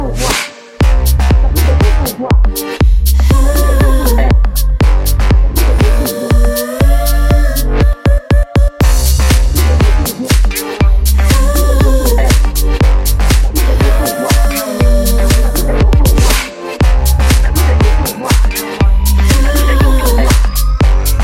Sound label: lauta uuu